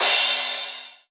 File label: Crash, Cymbals